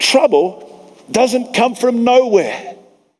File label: Vocal Phrases; Vengeance Samples; Vocals